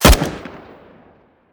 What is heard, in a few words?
aug, weapons; Counter Strike Model Pack; CS:GO